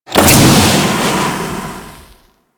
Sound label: Fire Vent Start; Warframe